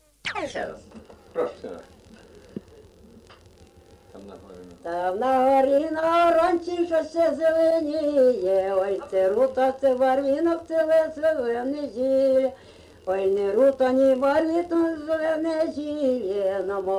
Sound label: vasilna